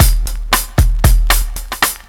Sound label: Bossa Back 115 bpm, breaks; Zero-G Beneath the Planet of the Breaks